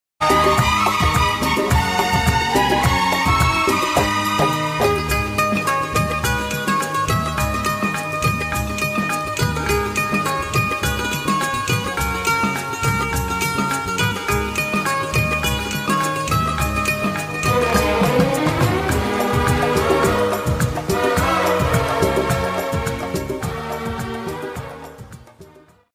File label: Instrumental ringtone, hindi